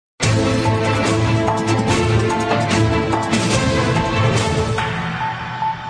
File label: tune